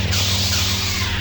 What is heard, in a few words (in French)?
Double allumage, Allumages Sabre Laser; effets speciaux